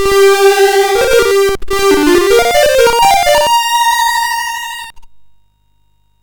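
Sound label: Operation Hormuz, MOS 6581 SID chip music, games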